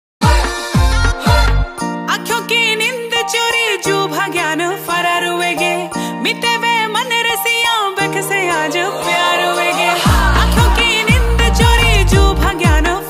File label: uttrakhandi ringtone